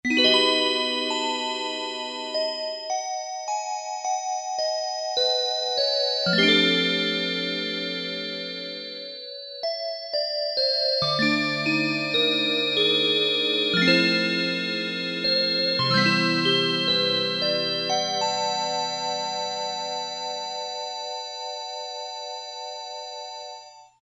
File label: Vibraphone, Casio MT-600; Tones